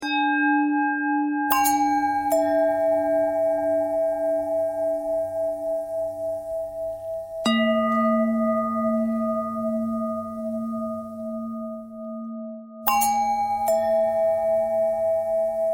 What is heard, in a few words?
long bell bell bend threads; bells